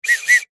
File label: whistle short toots 2x; foley; zapsplat